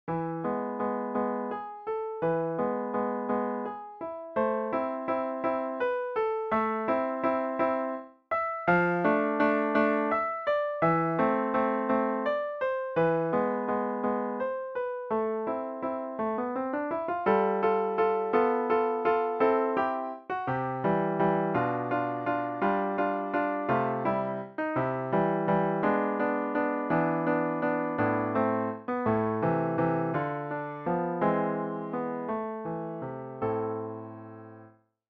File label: piano